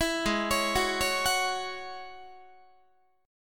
G sharp 7th Suspended 4th Sharp 5th G sharp, Guitar; G#7sus4#5; 19,16,16,16,17,17; arpeggiated